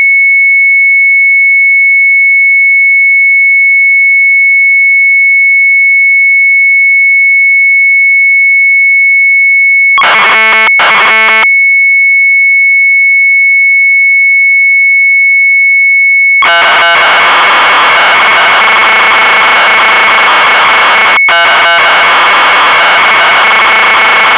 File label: MZ800 Tape; CHARACTER EDITOR; sharp; retromelsarchive